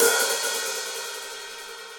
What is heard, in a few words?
Hihat Open Low Loud Squashed, Drum Hits; Standard Hits; Ableton; Factory Packs; Session Drums Club